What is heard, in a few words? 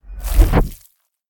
pounce jump; smite; Anhur